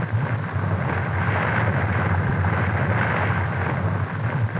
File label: LAVA LOOP; Gub2dem